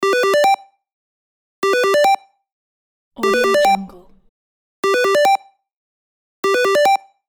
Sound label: retro game ringtone loop